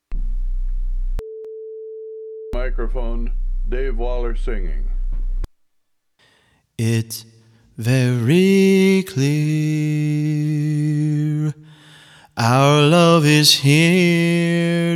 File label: Y microphone beep